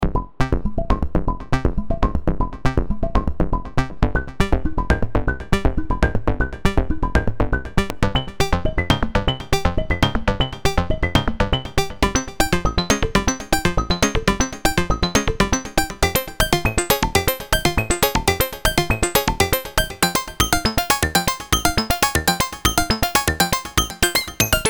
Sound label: CMI V My Additive Robowalk; Arturia; PageR